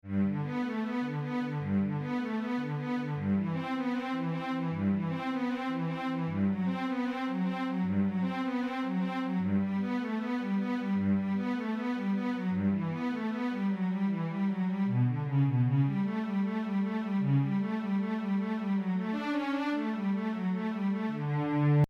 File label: Sweet Cello; ringtones; Johann Sebastian